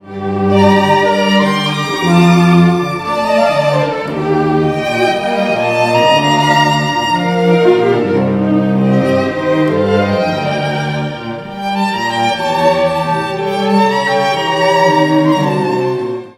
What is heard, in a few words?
Quartet